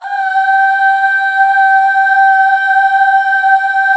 HAL UUHH, HAL Back Uuh Fem

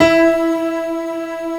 MIDI PNO, PIANO; East West; Global Sounds

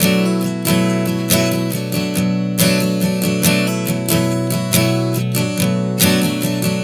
Strum 070 Bm, One Chord Strumming 070 bpm; Sonic Foundry (Sony Creative Software); Songwriter Acoustic Guitar Companion

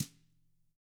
TAMA; ILIO; Double Platinum Drums 1

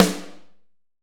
HALL SN, HALL SNARE

